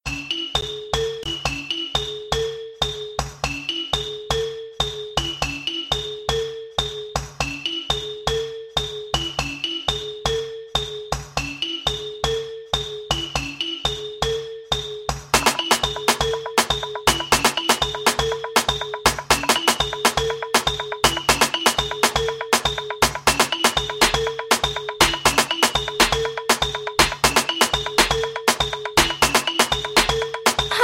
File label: Marimba Track